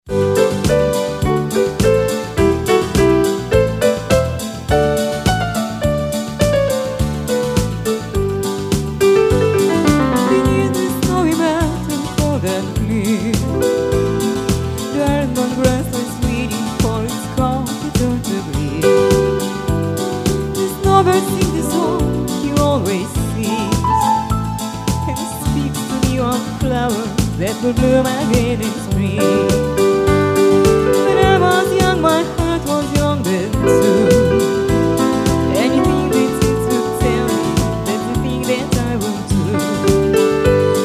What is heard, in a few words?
Cover Yamaha Vocal